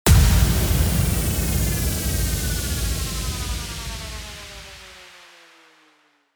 IMPACT

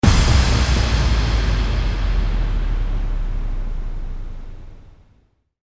IMPACT

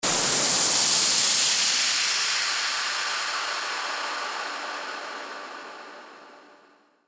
WHOOSH

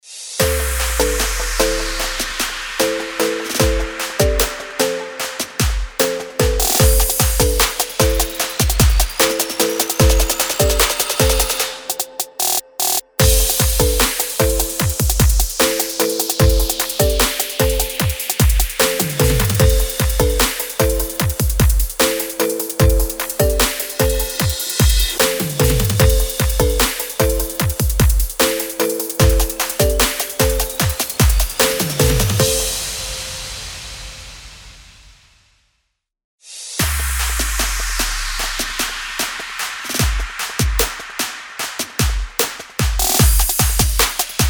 BED EDM TRAP AMIN 150BPM

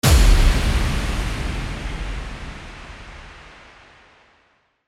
TRAILER HIT IMPACT

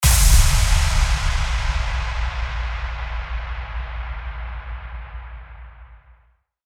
IMPACT

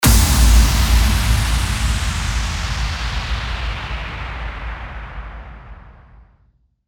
IMPACT

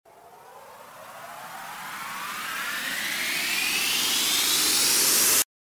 RISER

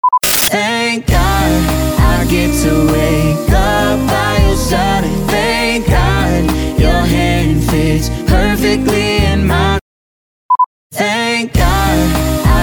100BPM Eb

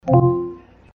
Amazon Echo listening sound

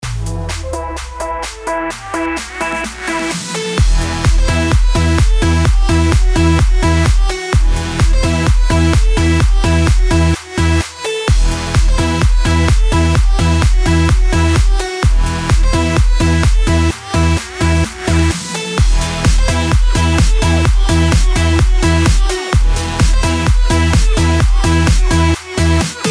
BED ELECTRIC HOUSE EMIN 128BPM

